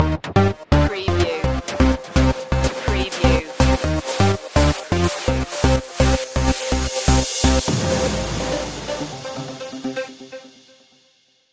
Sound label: swedish trance stinger